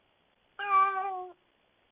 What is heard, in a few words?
cat meow